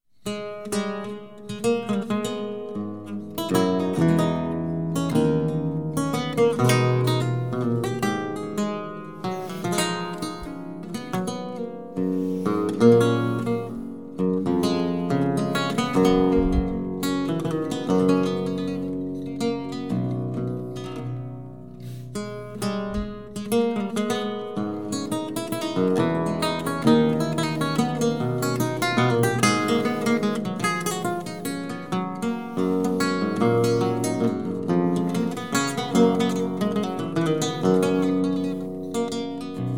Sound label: Courante